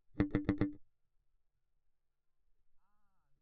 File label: Bass Mute